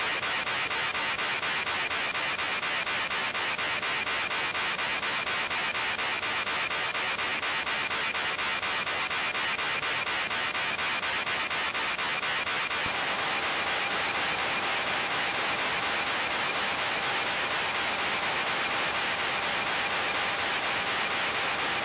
CDMA1x call

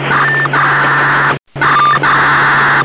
BIIS

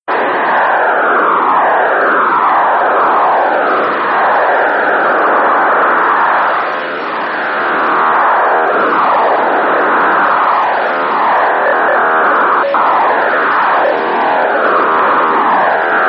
7290khz

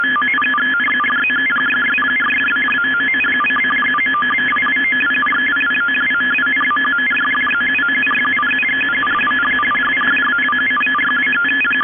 Weird marine signal wider

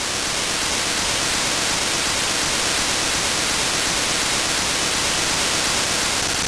Phase2 vicpol

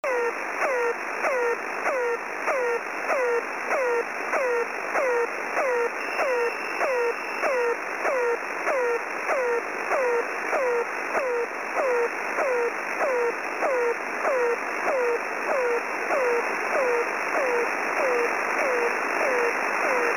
Websdr recording 4020.0kHz

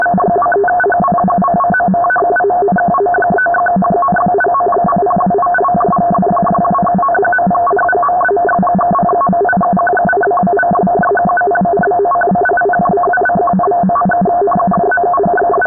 Weird marine signal

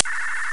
BIIS sound

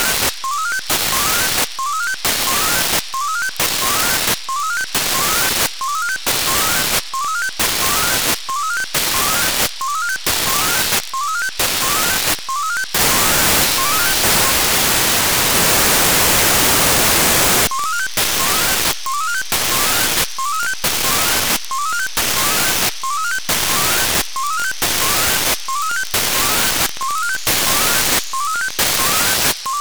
467.55 MHz WFM

